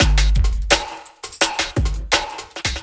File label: Beat, Drum N Bass